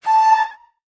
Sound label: affectionate scream A5, ghast; Minecraft; mob